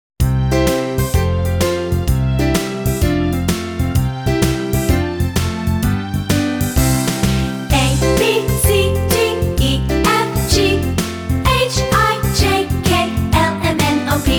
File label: The Alphabet Swing